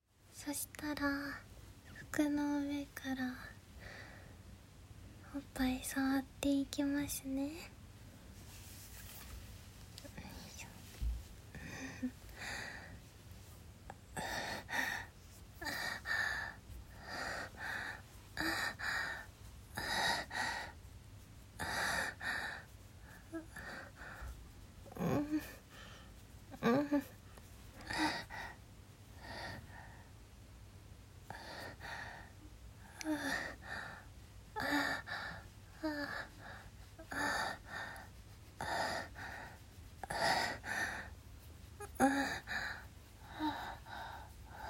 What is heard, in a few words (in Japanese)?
実演オナニー